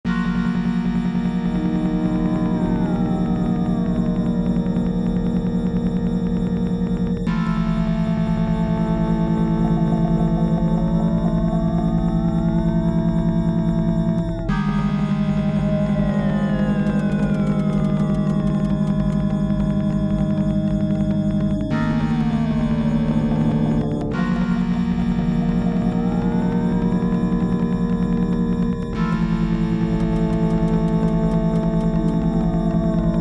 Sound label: Title Main Menu, SoundBlaster16 AdLib; Blackthorne; Blackhawk; DOS